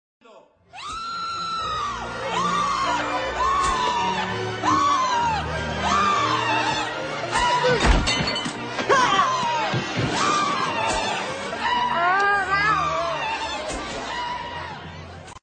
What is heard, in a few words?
scream